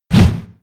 elbow; gunvolt

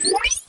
matrix swipe

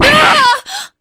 gah!

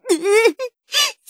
sob

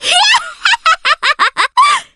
cackle

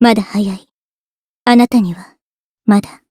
victory

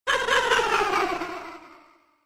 laugh; marx

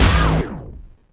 pirate rap pose

